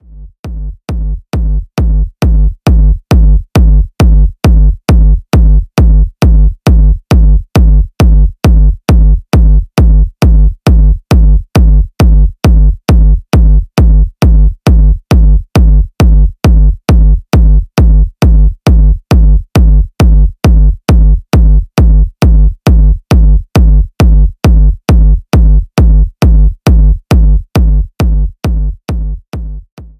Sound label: signature kicks; zumstein